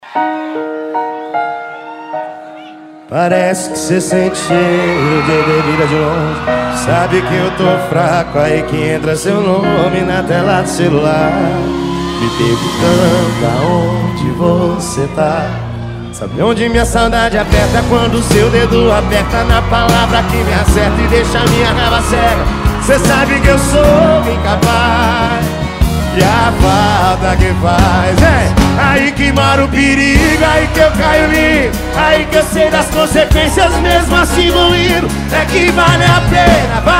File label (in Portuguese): sertanejo e pisadinha; thiago sound e tabacaria diamond; rifaina sp